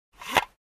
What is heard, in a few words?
Camera Lens Cover Slid Sound